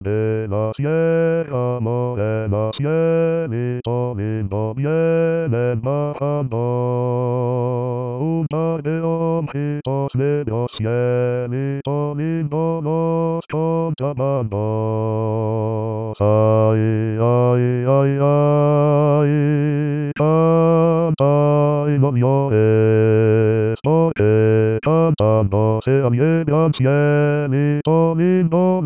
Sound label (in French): Basse; Cielito Lindo